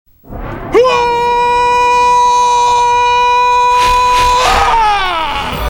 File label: HWAHHHHHHHHHHH